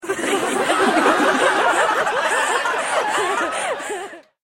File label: laughing joke